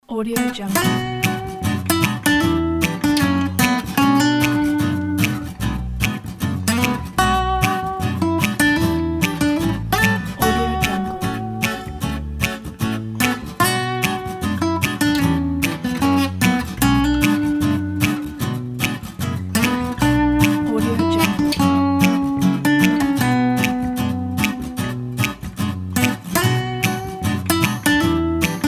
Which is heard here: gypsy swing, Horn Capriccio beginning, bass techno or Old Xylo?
gypsy swing